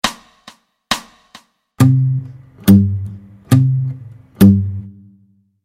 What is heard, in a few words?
Travis Picking